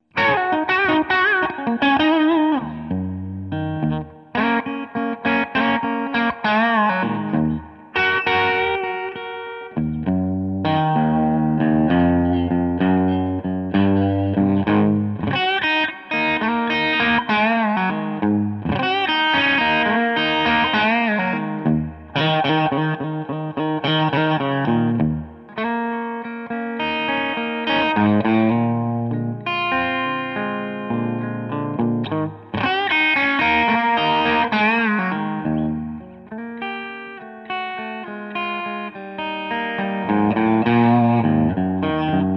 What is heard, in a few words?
low drive